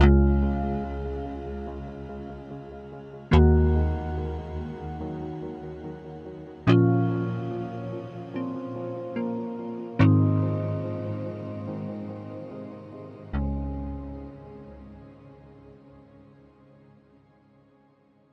reverb gentle bells; atlantis-reverb

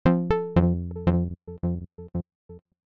bad connection clean dissolve

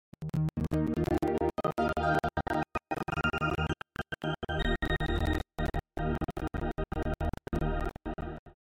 cpu overload, Bad Connection

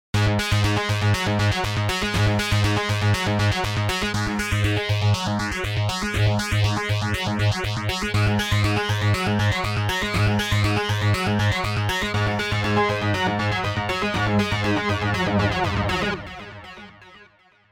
ripple

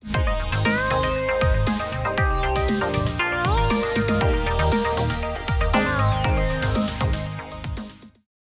humonica funk

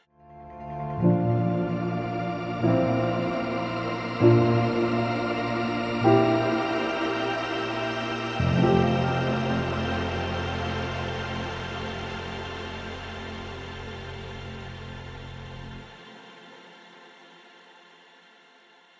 reverb epic swell; atlantis-reverb

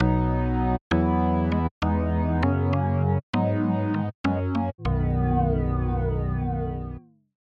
ripple smooth

